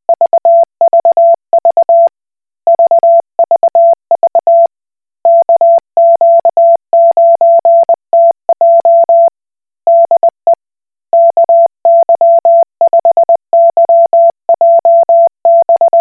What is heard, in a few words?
Koch Morse